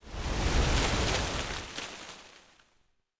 Tree Wind; Ambient; Civ4 warlords

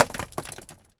small rock impact

